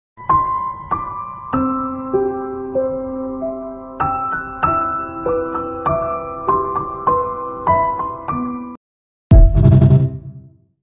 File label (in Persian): music, آهنگ کوتاه غمگین ایرانی